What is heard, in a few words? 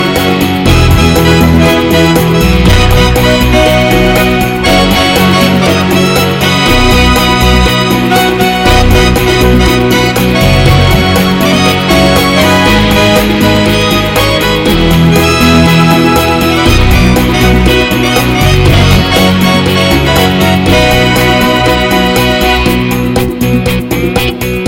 Super Rock Sounds